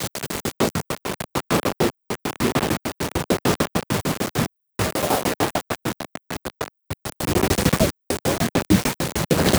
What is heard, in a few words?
Envelope Beat; Drums & Drones